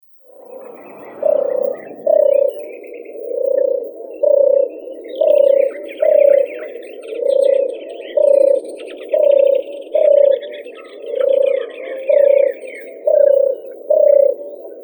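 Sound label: common turtle dove, Streptopelia turtur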